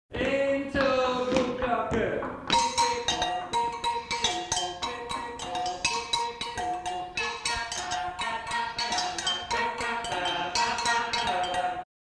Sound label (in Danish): Sang; Samba